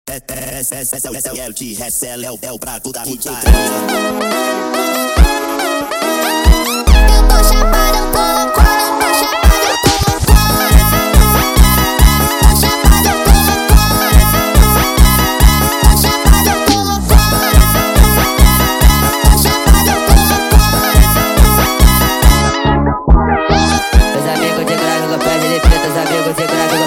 funk sped up

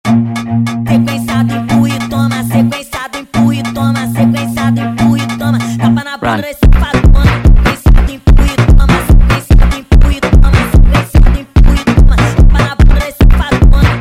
Funk sped Up

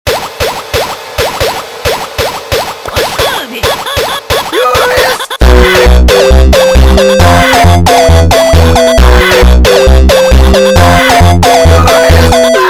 Montagem sped Up

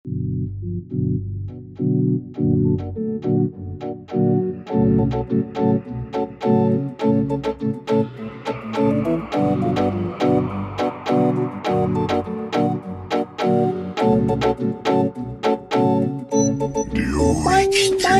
Walk Funk super Slowed

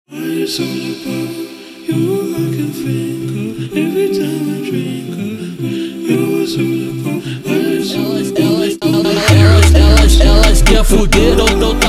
Funk

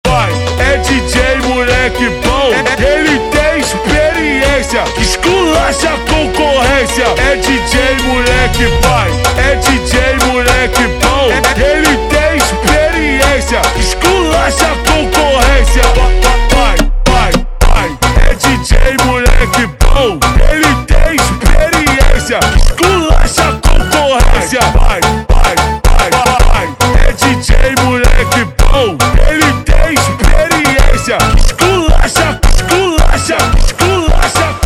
Funk Slowed

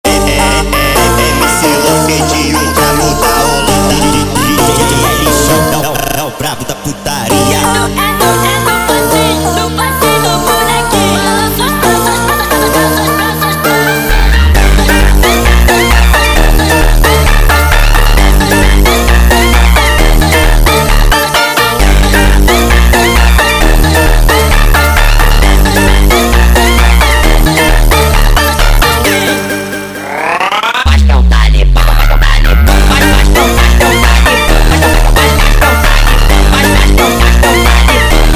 Montagem sped Up, funk

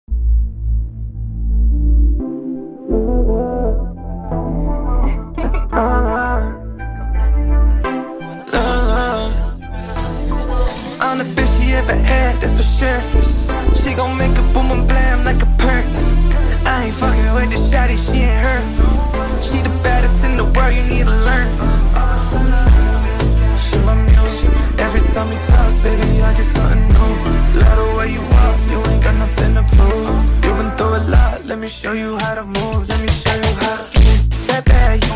brega Funk Remix

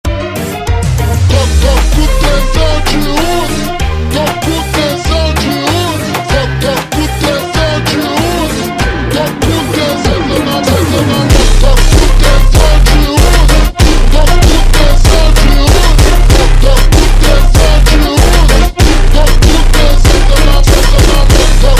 Bad Ending Jumpstyle super Slowed

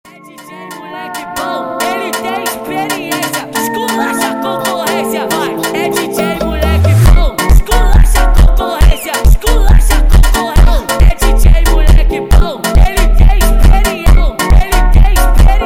Funk sped Up

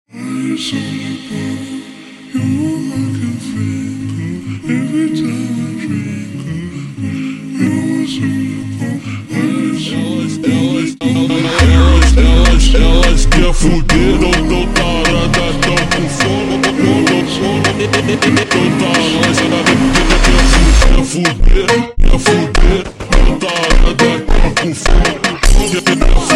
Funk ultra Slowed

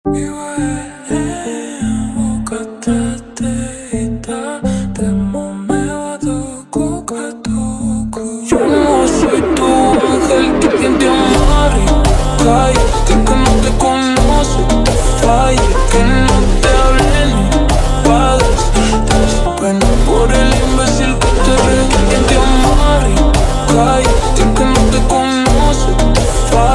Jumpstyle ultra Slowed

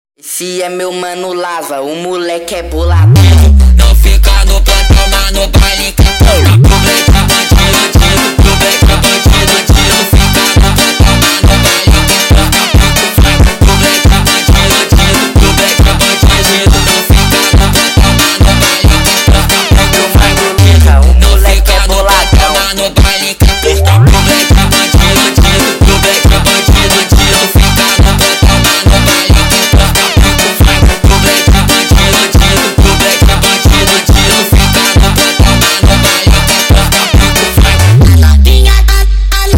Funk sped Up, funk

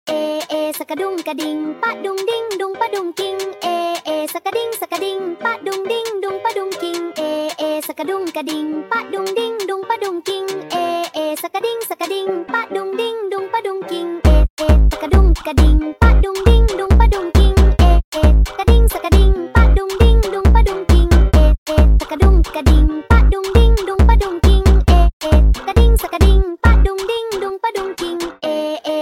Funk sped Up

